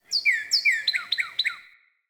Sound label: Cardinal Female Bird Song